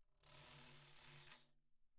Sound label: tambourine finger roll pp; Percussion